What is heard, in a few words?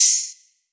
HH, Drum Mashines; HIHATS 1; EdgeSounds